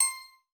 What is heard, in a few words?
counter